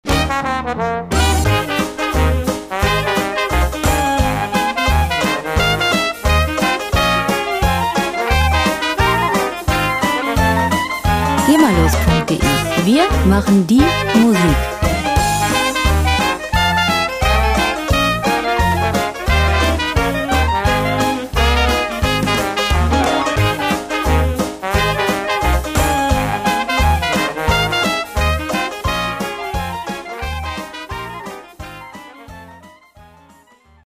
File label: farmhouse jazzband